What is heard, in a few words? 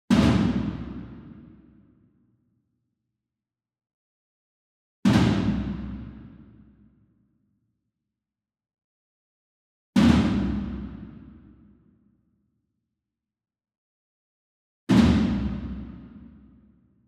ENSEMBLE DRUM Concert Toms Flam Far; boom library; Cinematic Strikes Construction Kit